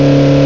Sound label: good car